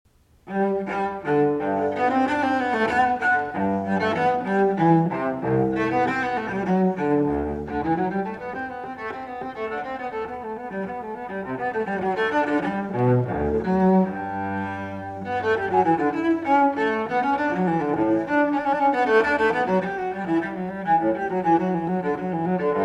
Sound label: Cello Suite in G Major, Courante; Bach, JS; No. 1; BWV 1007 III.